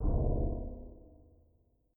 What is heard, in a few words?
Foot Fall; MetalTech Battledrome